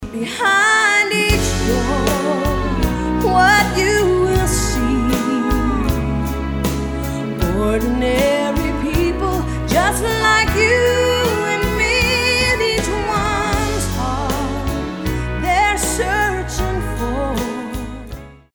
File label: SONG